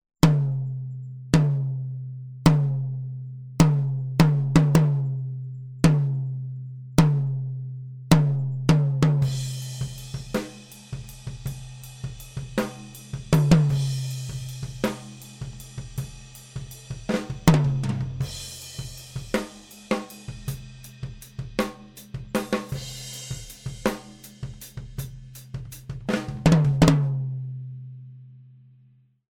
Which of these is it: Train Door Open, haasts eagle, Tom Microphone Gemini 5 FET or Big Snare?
Tom Microphone Gemini 5 FET